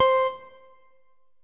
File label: octave guitar